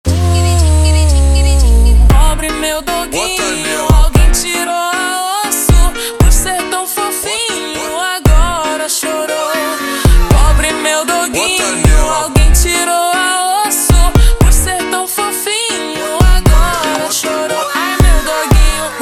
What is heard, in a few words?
Montagem slowed